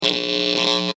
Electric Zap Short, Short, unstable electric arcing, high voltage; LYN111 package of Electricity sounds; Electrical Sound Effects; LynneMusic